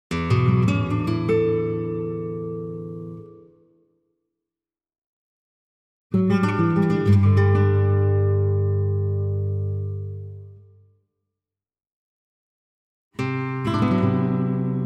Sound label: Jingle B Guitar version; Secrets of the Silent Witch Original Soundtrack (2025)